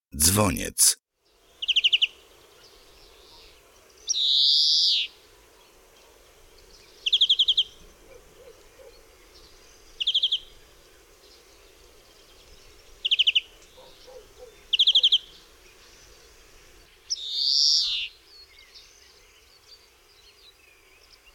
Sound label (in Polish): DZWONIEC